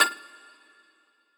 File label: Riddim Melodic Hit F Clicker; One-Shots; Ghosthack; Riddim Essentials Volume 2